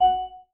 Class Harp, notifications

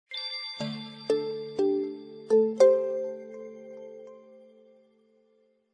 Phone Power on; Samsung; GT-C6620

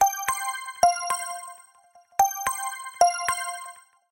Alarm Synth Chime; Samsung Z2; Ringtones

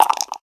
Throwing Dice; Realme 8

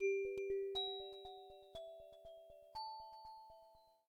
abstract tiles drag; Samsung Galaxy Note 4 SM-N910P

